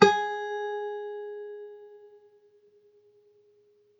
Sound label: string 1 fret 1